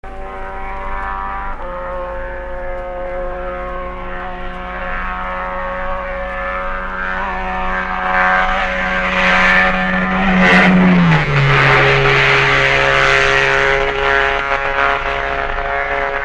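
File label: Jawa, motorcycle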